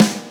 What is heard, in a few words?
drums; osu